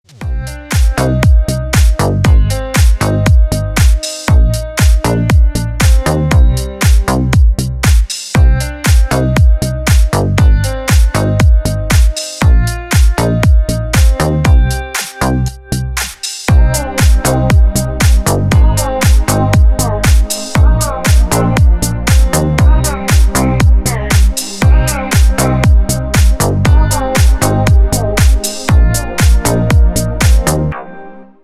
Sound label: call sound